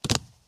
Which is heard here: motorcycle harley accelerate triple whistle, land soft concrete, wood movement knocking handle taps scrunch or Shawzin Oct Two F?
land soft concrete